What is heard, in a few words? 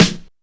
deep acoustic snare sample f key; snare drum samples